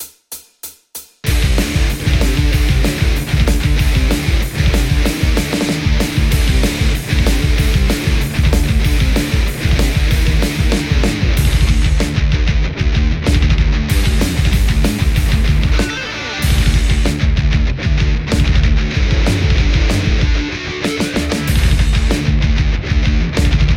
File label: metal Les Paul; scuffham sgear2